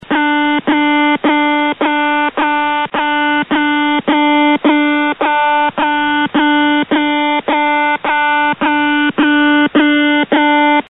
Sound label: the alarm